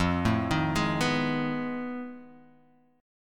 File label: F 7th Sharp 9th Flat 5th, Guitar, Open G